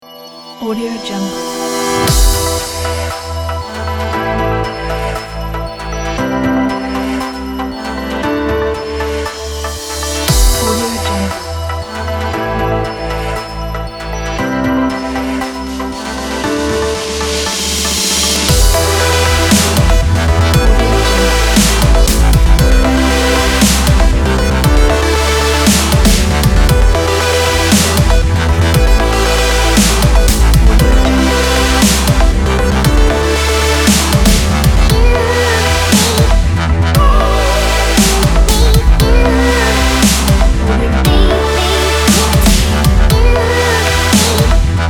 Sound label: electro future